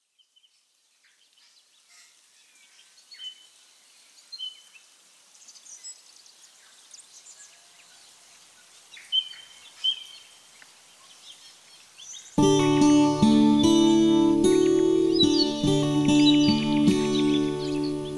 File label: music ambiance vocals lead